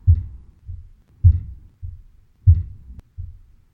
hammer drop alternating before after

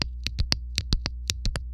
footboard comparison pro d2 piezo back shoes

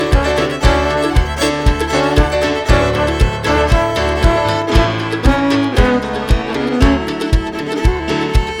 trombone d

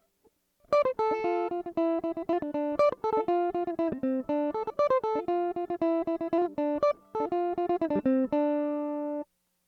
mandolin comparison electric in

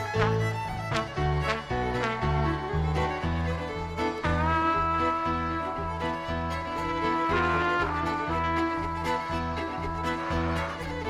trumpet

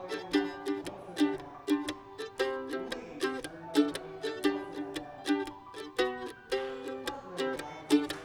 mando

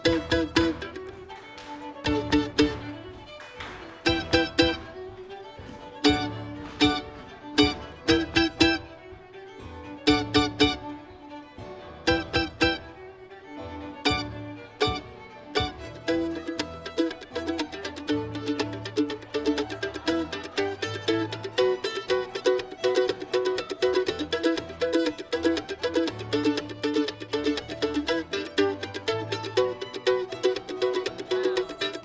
mando, mando chops

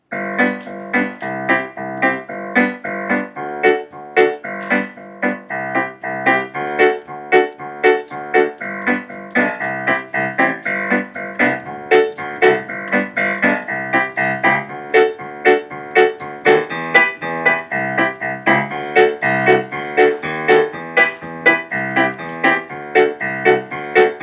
left hand example, simple piano recordings

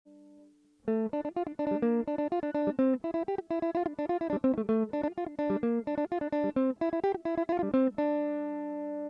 mandolin comparison electric srml

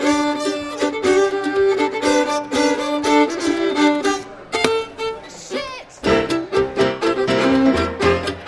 string break

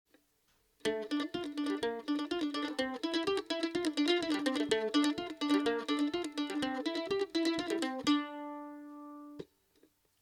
mandolin comparison acoustic

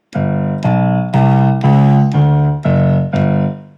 G B C Db D Gb G bass, simple piano recordings

